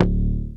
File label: Tumpa Bass; Big Fish Audio; Old Skool House; OSH Bass